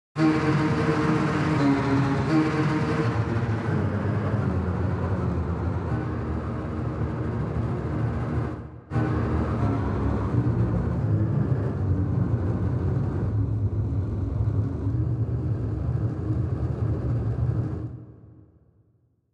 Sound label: tremolo